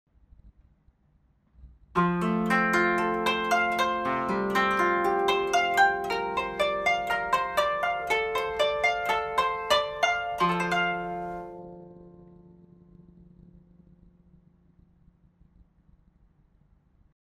koto

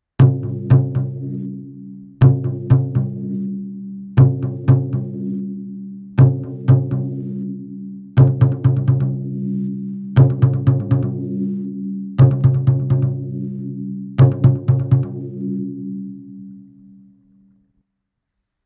Taiko

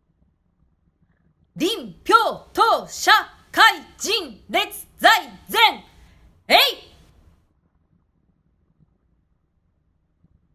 voice kuji

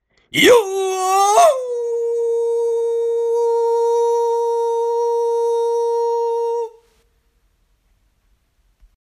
voice iyoooo

